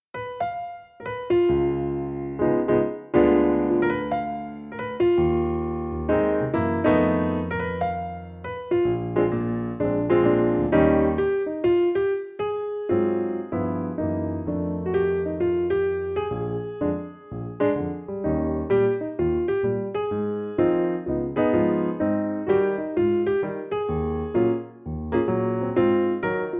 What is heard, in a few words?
Solo Piano